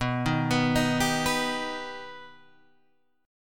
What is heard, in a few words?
B Augmented B Guitar Open G, chord